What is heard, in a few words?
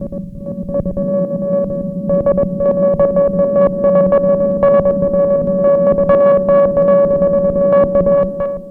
Oberheim Pulse, Synths; Sonic Foundry (Sony Creative Software); Electronic Point Blank